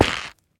step, stem; minecraft; block

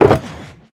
open, shulker box; minecraft; block

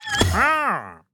reappeared, wandering trader; mob; minecraft

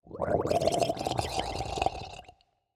idle, drowned water; mob; minecraft

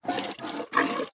cow milk; minecraft

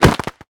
crit, player attack; minecraft

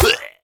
death, illusion illager; mob; minecraft

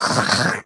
attack, rabbit; Minecraft

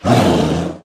polar bear warning; mob; Minecraft

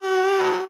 moan, ghast; mob; minecraft

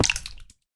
guardian land hit; mob; Minecraft

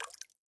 pointed dripstone drip water cauldron; minecraft; block

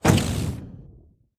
shoot, shulker; Minecraft

